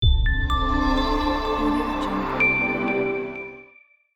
digital logo reveal

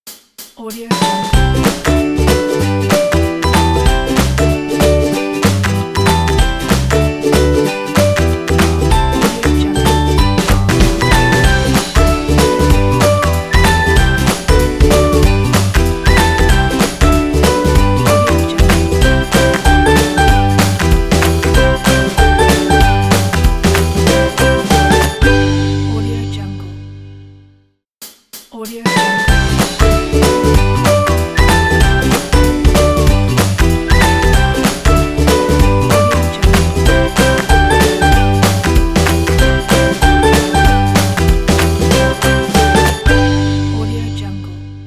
fun happy upbeat logo

